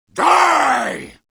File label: attack; slender; killercroc